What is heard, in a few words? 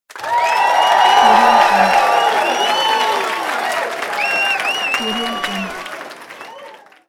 crowd cheers